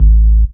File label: DR. LOOP, BASS; X-Static Goldmine 3